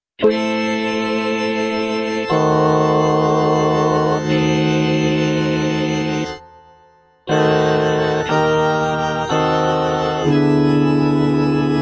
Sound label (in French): qui tollis basse